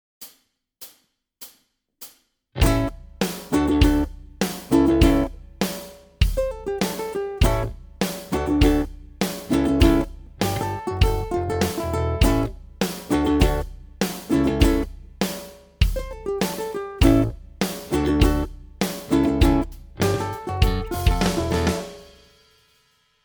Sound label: Groove Workshop, ukulele